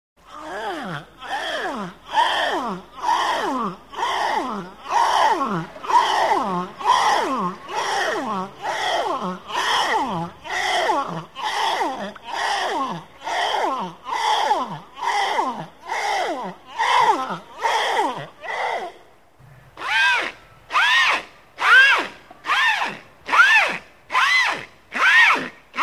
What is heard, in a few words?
orca